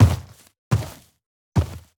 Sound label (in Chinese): 嗅探兽脚步声